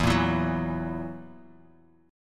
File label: E Suspended 2nd Flat 5th E down Bass Standard; Esus2b5 strummed